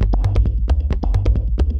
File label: Synth Bass; Basses